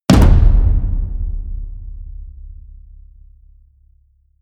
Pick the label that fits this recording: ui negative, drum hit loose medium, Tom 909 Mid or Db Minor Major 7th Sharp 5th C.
drum hit loose medium